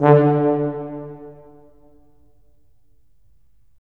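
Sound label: brass tuba; LA Composer vol. 3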